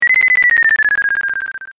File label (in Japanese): アカショウビン 合成; 人工アカショウビン; 鳥の声研究室